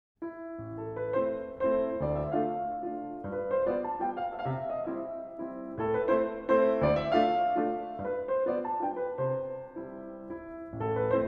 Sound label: Waltz No17 In A Minor, piano